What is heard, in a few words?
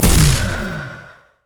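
tryndamere bloodlust; LoL; demon sword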